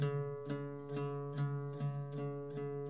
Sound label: luth; GUITARS